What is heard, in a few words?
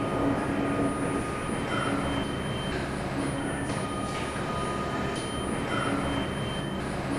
ambience motor